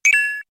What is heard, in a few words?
Notify; FFSound